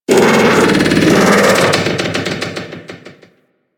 Thumper Bot Spawn Groan B; Warframe; Grineer

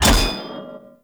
Shield Impact Player Flesh; Weapon Impacts; Enemy; Shields; Sinecure Shield

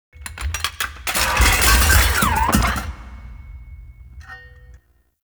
Necramech Ranged Death Fall; Enemies; Warframe

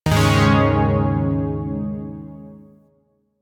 Yo Chord B, Zariman Shawzin; Music; Warframe

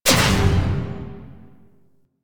Electricity Hazard Pulse; Warframe; Entrati; Vaults

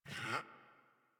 Puppy Idle Pant Short; Enemies; Vocals; Kubrow; Warframe

